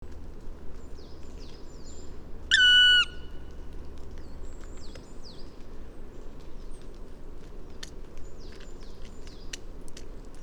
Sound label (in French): pic noir appel